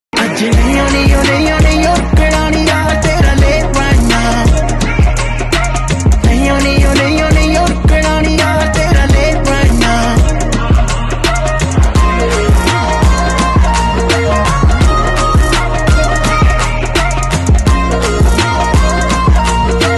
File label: Punjabi Song